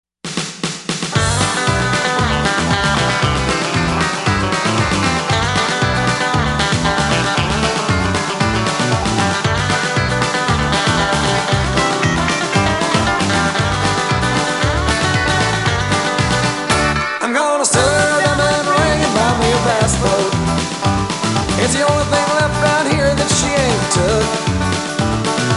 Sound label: bass